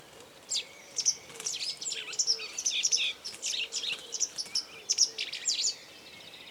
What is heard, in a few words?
White Wagtail Motacilla